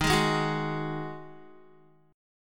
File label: Eb Minor Double Flat 5th, Guitar; strummed; chord; 10,11,8,11,8,x; JGuitar